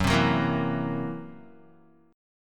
Gb 7th Flat 5th, Gb7b5 chord, Guitar, 4E,4A,5D,5G,5C,6F; strummed; JGuitar